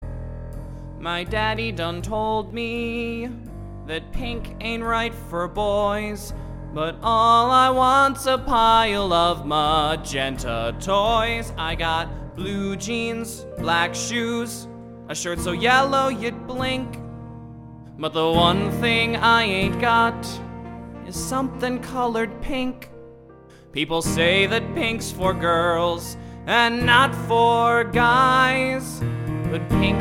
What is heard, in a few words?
peters pink blues